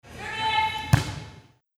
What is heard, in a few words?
volleyball serve with grunt